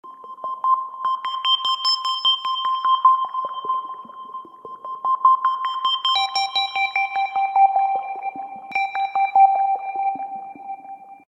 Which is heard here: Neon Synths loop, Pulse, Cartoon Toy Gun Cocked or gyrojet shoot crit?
Pulse